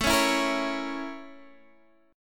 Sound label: A sharp Minor Major 7th Flat 5th A